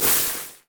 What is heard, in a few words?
bandage toss; LoL; badrobotamumu